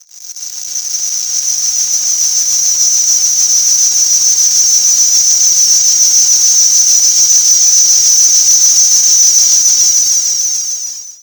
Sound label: Static; Blastwave